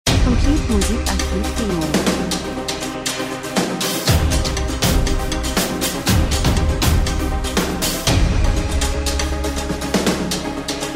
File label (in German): drums, rhythmus; Mystisch geheimnisvoll Spannung schweben gleiten Natur Reisen Film Pauken Klangfläche; Stimmung